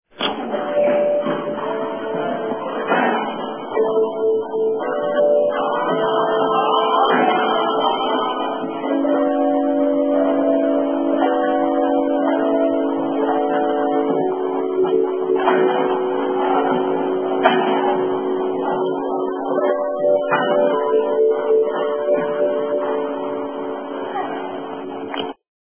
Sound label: chime